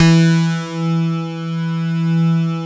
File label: PERC BAS; Synclavier Sampler Library; KEYS AND GUITARS